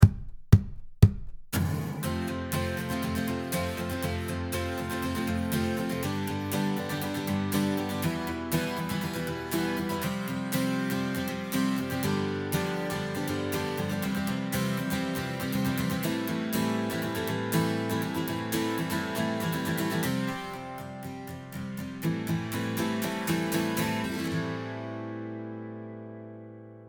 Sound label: Strummed